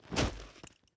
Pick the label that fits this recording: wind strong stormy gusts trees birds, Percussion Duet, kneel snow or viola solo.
kneel snow